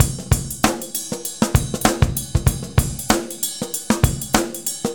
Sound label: pop shuffle, Drumloops